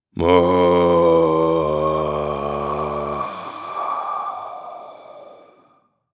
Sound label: Horror Scary Ghost Moan Breathe Growl Ghost Moan